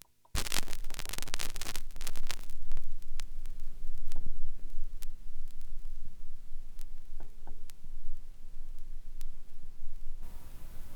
vinyl; Masterbits; Vinyl Attack

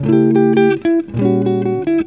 EGUIT ARPEGG; Best Service; World Colours

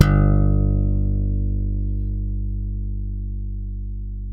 ALEMBIC BASS THB G 1; Masterbits CLIMAX 7 - Bass

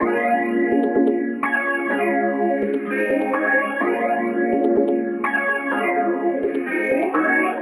126 A Dry, MELO + FILTER; CruelOath; RoBKTA Sample Pack Supreme

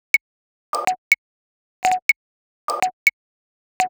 Teknik Top E 123bpm, House Drumloops; Zero-G House Fabrik Drumloops

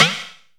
Shock Snares, Percussion; Dance Synths

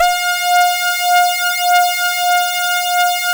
saw res dry, synth

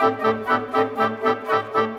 Winds, Orchestral; Sonic Foundry (Sony Creative Software); Orchestral VOL-2; Modern 20

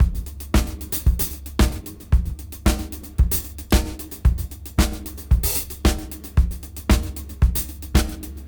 113 BPM; AMG; Keith LeBlanc Phat Butt Jams

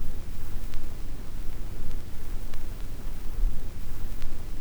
Tape Noise; noise hiss crackle

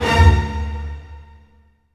HIT, orch hits; Orchestral Loops

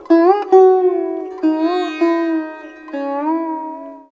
SITAR; Spectrasonics; Heart of Asia

